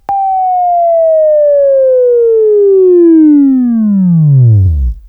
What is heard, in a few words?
Buzz